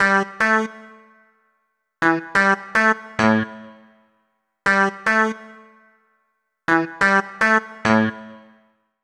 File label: S SYNT1, Roots Funk; USB Soundscan vol.41; AKAI